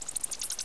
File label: Mouse